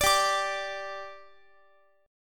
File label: G 5th G Guitar, chord; G5 strummed; x,x,x,19,19,21